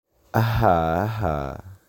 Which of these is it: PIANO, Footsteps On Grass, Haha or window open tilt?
Haha